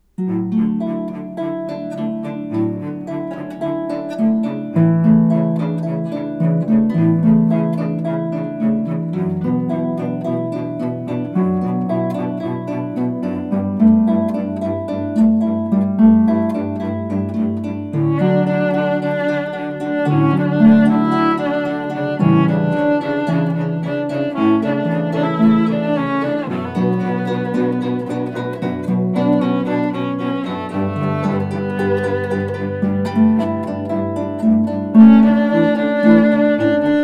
harp trio

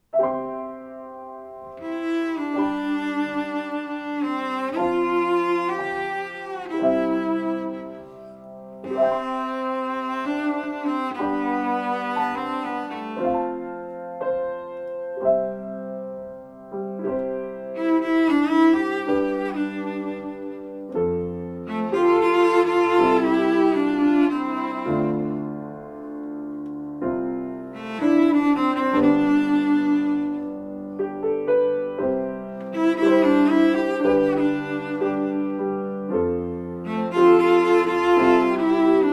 with piano

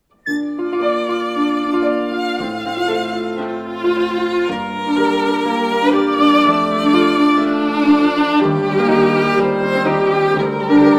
Romance - violin & piano; Dvorak

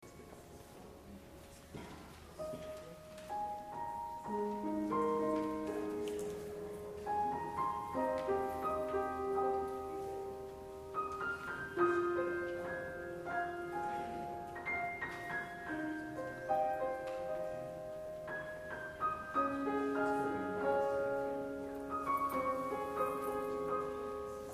piano

